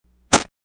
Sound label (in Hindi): kisi utha ke niche fek, body fall